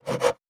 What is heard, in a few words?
Saw Wood; Survival Game Sounds - Lite Edition; Crafting